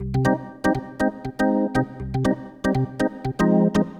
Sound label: RHOD C1; DANCE; KEYS; MAGIX